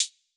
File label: HAT, Closed Hats